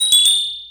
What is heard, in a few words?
chimecho; cries